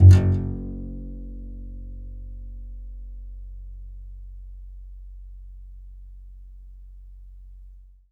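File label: contrabass balalaika, Plucked Strings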